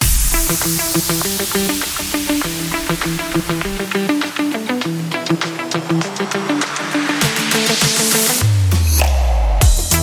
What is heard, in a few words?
Modern Energetic Advertising; MIUI12